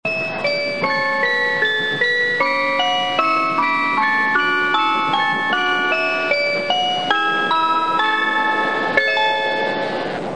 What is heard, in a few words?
train chime; 雷鳥; ４８５系のチャイムです。２００８年春頃から電子音チャイムへ交換されています。